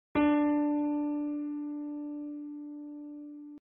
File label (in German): Ton, Klavier einzelne Toene